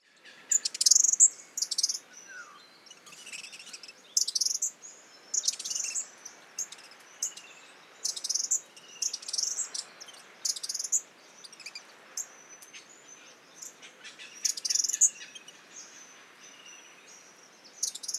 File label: spotted scrubwren